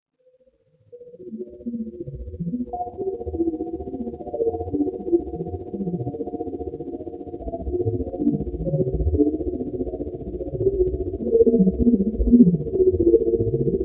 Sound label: DEEP ATMOSPHERICS; IT CAME FROM ALPHA CENTAURI